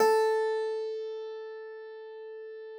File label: piano A, Thumb Tack Piano ff; Best Service ProSamples vol.53 - Classical Prepared Pianos; Total Piano